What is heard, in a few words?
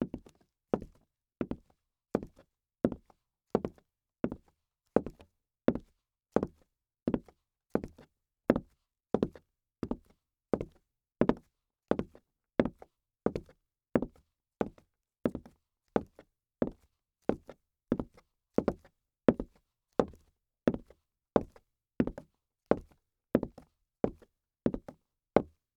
Pumps Walk; Footsteps; HauntedBloodlines; SFX Producer